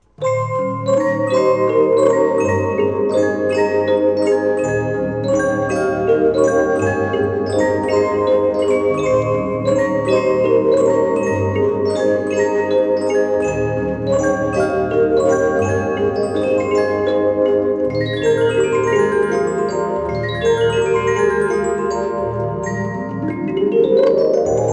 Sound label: music box; Five Nights at Freddy's; FNAF 2